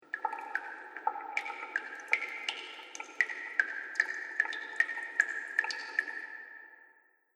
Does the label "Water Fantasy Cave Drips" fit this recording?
Yes